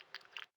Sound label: slosh; Lewd SFX